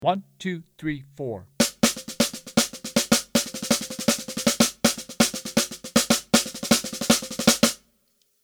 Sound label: Swing 8ths Adaptive Overlay